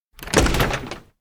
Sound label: door vet open fast; anemoi